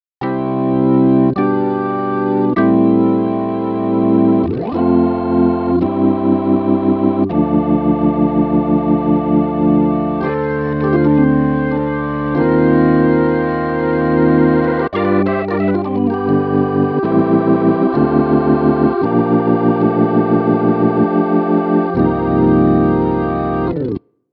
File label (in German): Roland Orgel